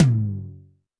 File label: TD-20 Tom A, Roland TD-20 Toms